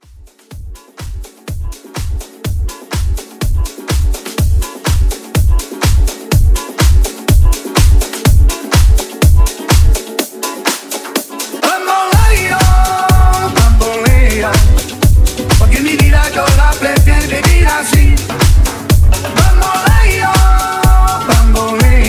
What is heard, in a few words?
Latin Club 124